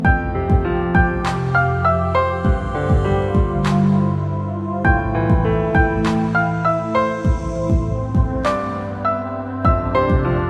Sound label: Piano Good